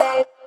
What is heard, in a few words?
C STAB; Loopmasters; Funky Underground Vol 2